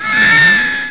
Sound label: SCREAM